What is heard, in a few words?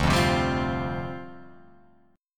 D Minor 11th D down Guitar Open F, Dm11 chord; Dm11 strummed; JGuitar